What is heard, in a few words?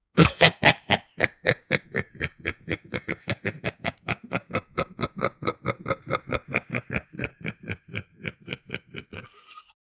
little monster laugh brea